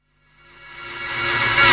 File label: thick reverse; Weird and Interesting Hits; single hits